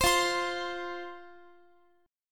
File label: F 5th F, down, Guitar; F5 strummed